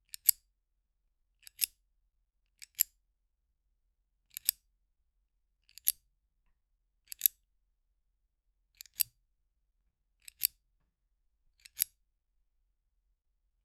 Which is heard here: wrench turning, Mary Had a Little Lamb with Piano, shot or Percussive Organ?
wrench turning